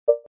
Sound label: Popup; LG SU780 Lollipop 3